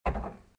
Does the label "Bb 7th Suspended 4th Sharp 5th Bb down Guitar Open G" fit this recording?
No